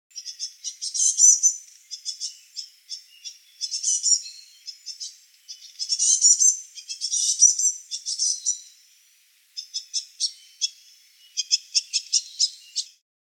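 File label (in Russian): moskovka golos, coal tit voice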